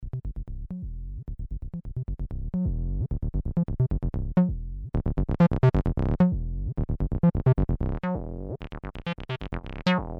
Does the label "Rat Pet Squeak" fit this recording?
No